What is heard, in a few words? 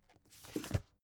book take shelve hard